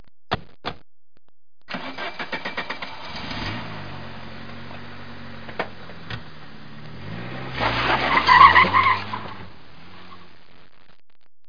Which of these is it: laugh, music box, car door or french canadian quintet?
car door